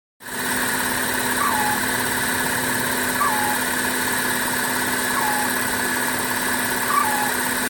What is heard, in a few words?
engine noise, 2005 a6 noise; can you identify engine noise